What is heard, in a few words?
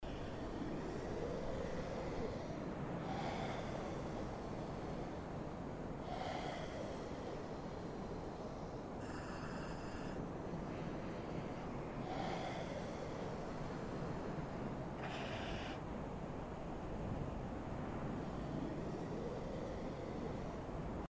darksouls ambient close to enemie; Dark Souls III